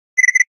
notice; STALZONE

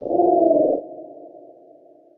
Small ambient crow; STALZONE

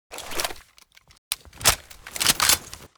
f2000 reload; STALZONE

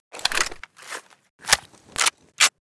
kriss reload; STALZONE

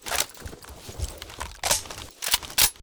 hk416 reload; STALZONE

